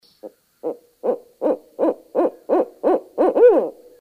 Owl Barred Hoo Hoo Hooah

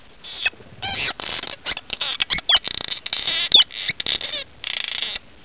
swallow cliff song, cliff swallow song

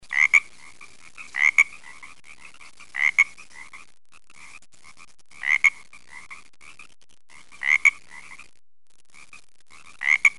pacific tree frog

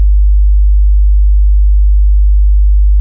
Tone 50Hz

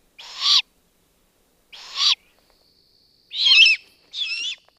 Owl Great Horned Fledgling

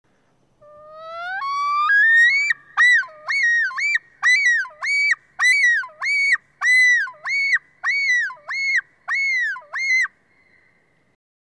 loon

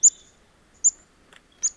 quiz call

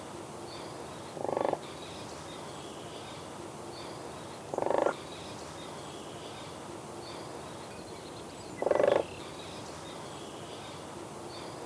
crane; WCEP

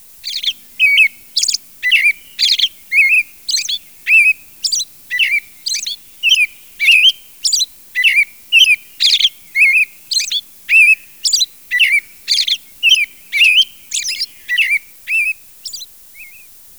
Robin continuous song

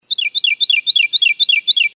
Carolina Wren

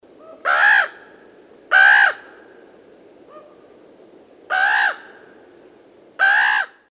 Raven Nestlings; Lang Elliott

